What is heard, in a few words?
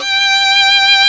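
SOLO STRING G1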